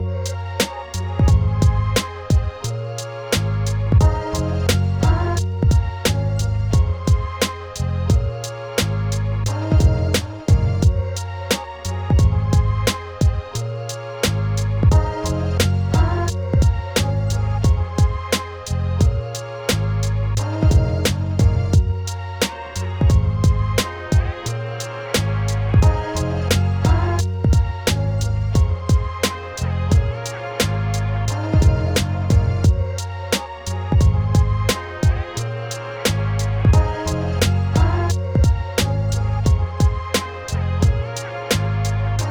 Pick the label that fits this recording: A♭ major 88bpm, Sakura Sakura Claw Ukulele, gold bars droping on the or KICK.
A♭ major 88bpm